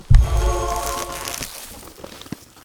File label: healing tree; Nature